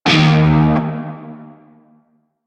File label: Shawzin Oct One D Sharp; Music; Warframe; Narmer Shawzin